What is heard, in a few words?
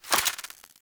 Mode Switch To Auto, Pistol; Weapons; Grineer; Amphis